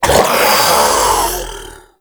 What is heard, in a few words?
Shoulder Fire Start; Enemies; Infested; Warframe; Femur